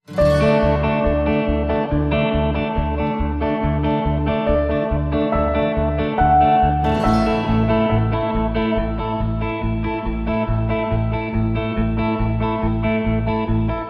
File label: Wonderful Moonlight, No Drums